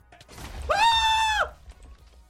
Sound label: manly scream